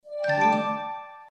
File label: notification